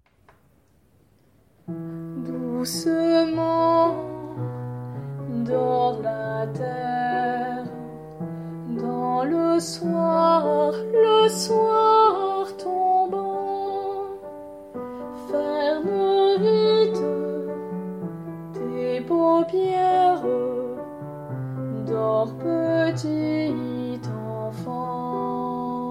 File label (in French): Voix; Berceuse cosaque